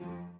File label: piano